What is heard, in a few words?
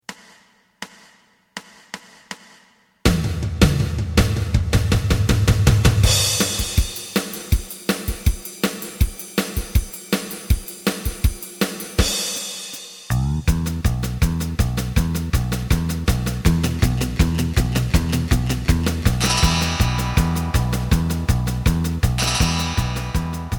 lead; エレキバンド用カラオケCD